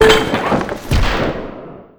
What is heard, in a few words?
Chute